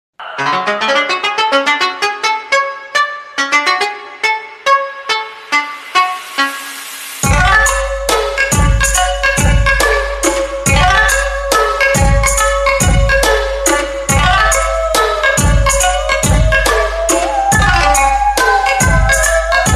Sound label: Mobile Ringtone